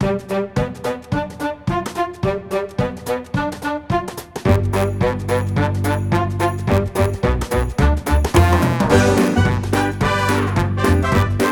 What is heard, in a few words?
Christmas rock n roll